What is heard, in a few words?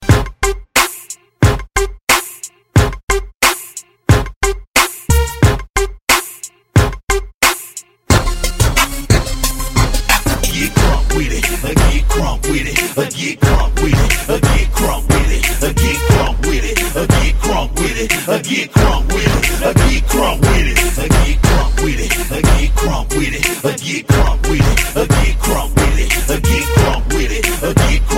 beatz